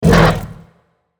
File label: Monster; Zenless Zone Zero